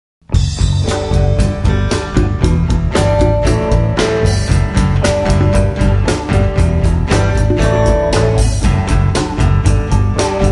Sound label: Joyful Noise, music